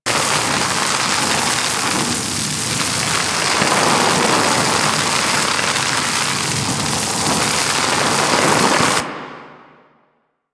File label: Flame Laser Impact Loop; Warframe; Corpus; Corpus Railjack; Spec Ops Fire Moa